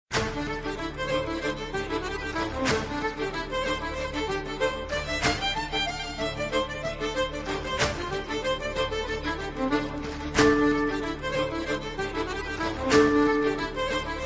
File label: xylo; modele leger